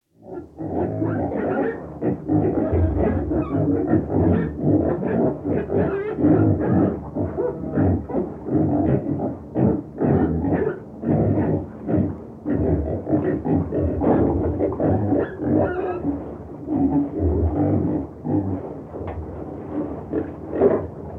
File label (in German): schweine & ferkel; Auf dem Land